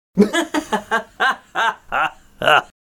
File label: laugh